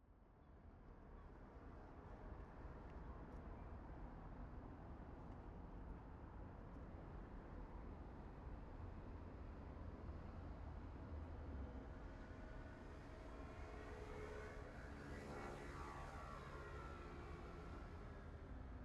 City plaza, Traffic, Sparse voices and activity, midday; Sennheiser 8020; Pole Position Production; Ambiences for Atmos Vol. 1 – Cities